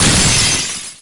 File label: Enemy Iceberg Crystal Attack Hit